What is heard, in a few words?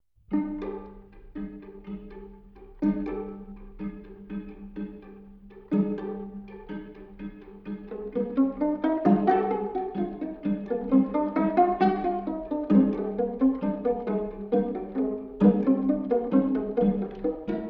Quartet C Major, String Quartets; Béla Bartók